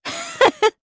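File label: Laugh Madness, Japanese; heroes; Xerah; kingsraid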